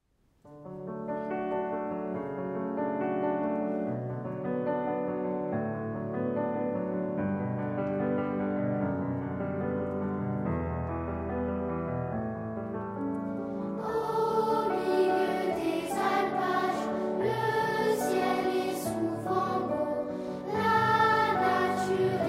tutti; le troupeau

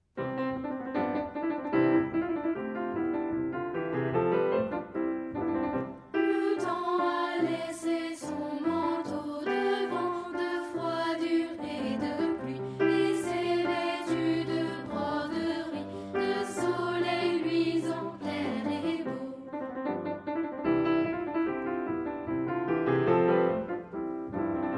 piano chant

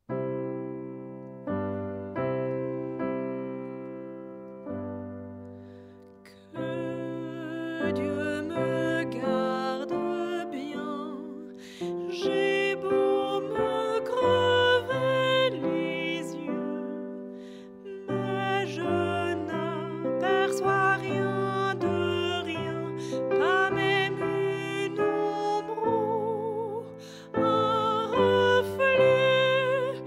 piano chant

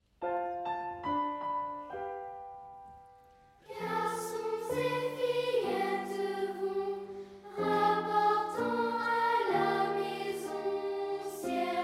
piano voix; projet vox les rameaux

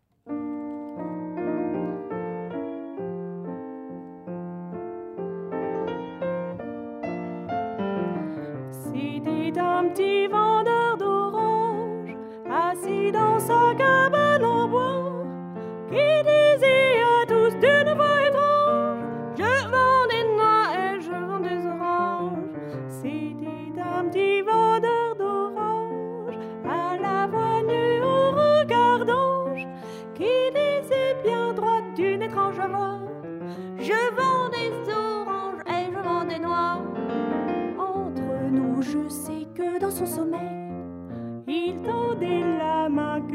piano chant; valse 6e symphonie

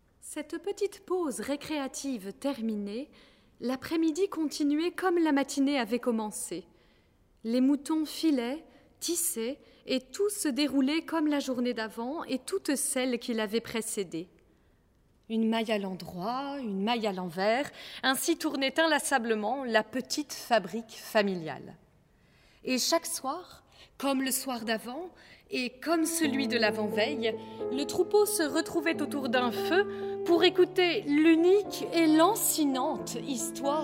tutti

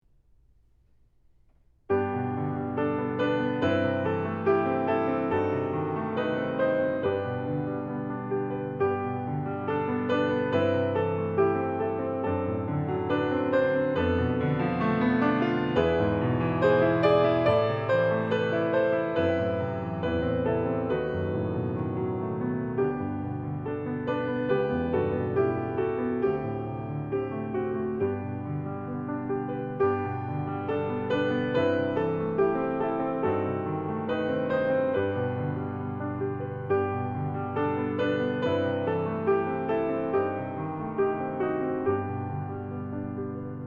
le chant des oiseaux piano

